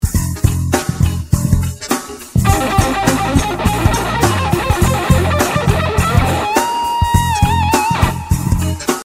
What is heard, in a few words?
Lick